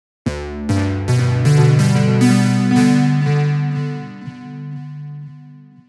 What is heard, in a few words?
Aphelion Prayer Bells; jup8